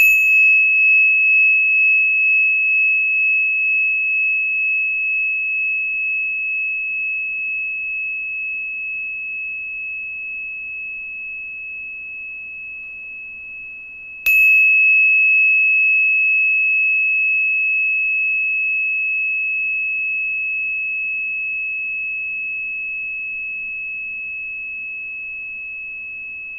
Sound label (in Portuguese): Pin Mi, instrumentos de quartzo; escutar instrumentos de quartzo